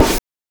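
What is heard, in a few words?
BRK SD CH, snare drum closed hi-hat; NES DPCM; MIDINES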